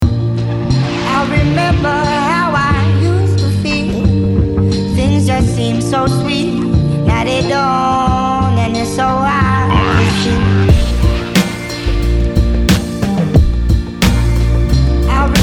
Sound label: Instrumental